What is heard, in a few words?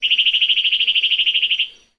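bird environmental; Hub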